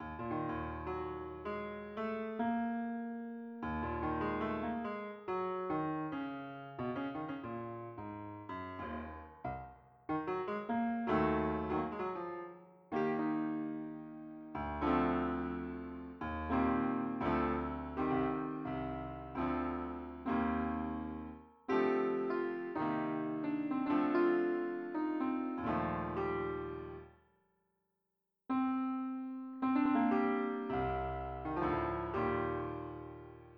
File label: piano